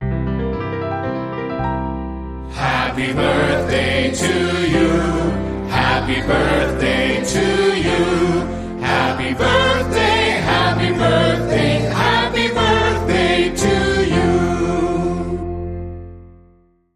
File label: Adult Traditional Vocal Happy Birthday